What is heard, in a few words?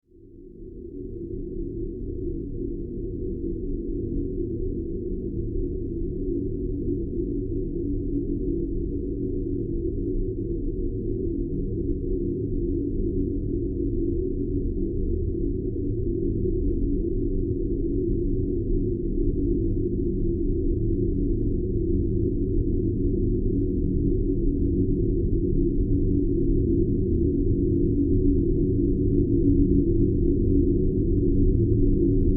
Horror Drone Ethereal Powerful Angelic; Evil